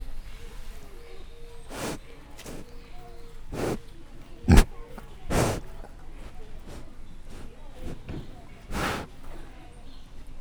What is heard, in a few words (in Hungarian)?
mono közelről, vietnámi csüngőhasú sertés; Győri Zoo 2014